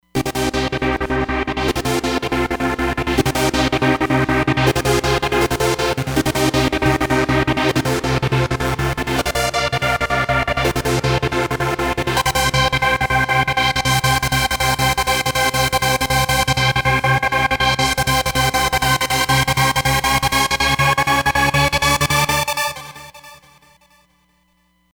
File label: Auto Trance, Roland Fantom XR